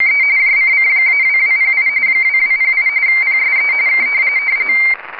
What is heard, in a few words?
rtty